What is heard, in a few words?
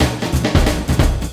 LOOP SD, 180 BPM; Ueberschall; Drum & Bass Flash Trackz